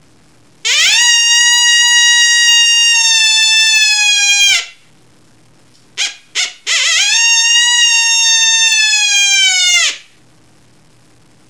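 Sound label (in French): Appel Interrogation femelle adulte, coyote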